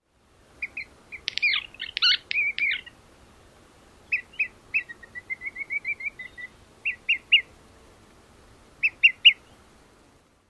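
Olive-sided Flycatcher, encounter calls with bill snaps; NY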